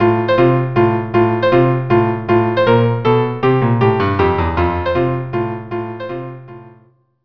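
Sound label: violin cello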